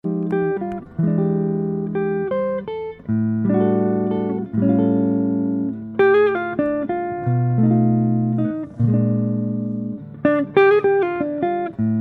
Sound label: peerless sunset sunburst